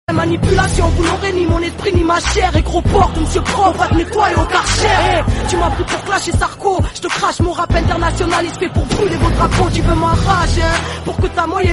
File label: french rap; ringtone